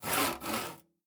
construction saw

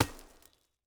lumber tree

